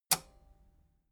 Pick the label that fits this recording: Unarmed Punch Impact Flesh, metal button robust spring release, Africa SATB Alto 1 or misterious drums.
metal button robust spring release